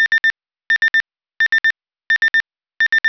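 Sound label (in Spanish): tritono, sirena electronica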